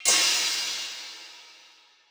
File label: Crash Feel, Cymbal; One Shots; Drums; Ableton Live 12 Lite; Core Library